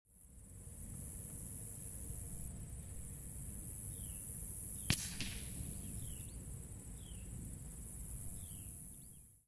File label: Suppressed 300BLK Shot With Impact; silencer